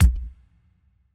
Bass Drum

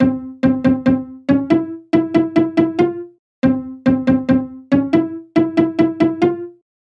Pizzicato C

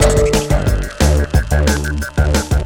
Jump Up

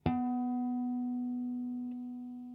Guitar Harmonics

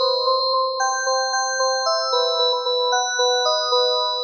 Halloween Hip Hop

